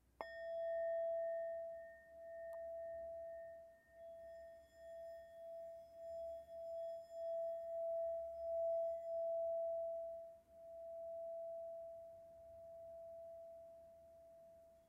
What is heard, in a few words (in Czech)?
tibetska misa